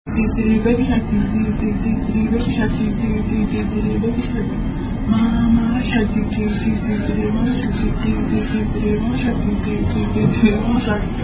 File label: The Shark Song, Attack Song